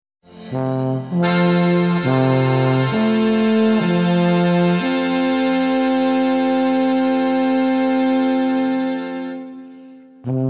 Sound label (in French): cor des alpes